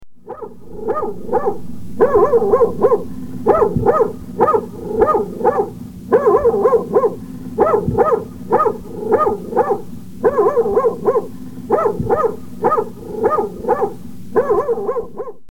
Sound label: dog barking